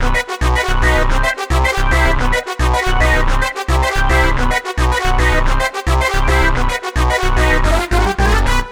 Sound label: Power Pellets